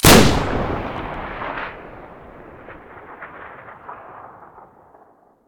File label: aa12 muzzle stereo, weapons; STALKER; IWP